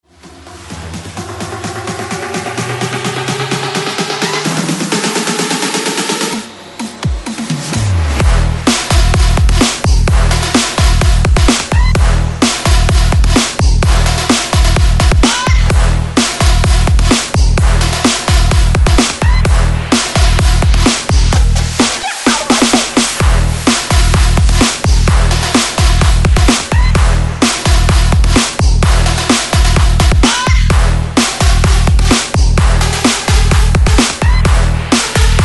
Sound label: break mix, 128